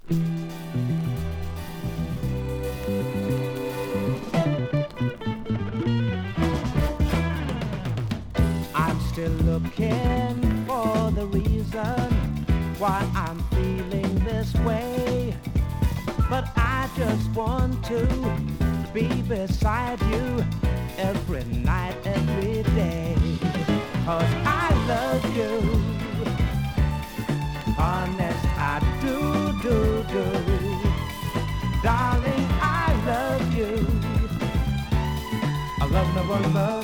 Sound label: Jamaica Islands Funk Disco; RECREATIONAL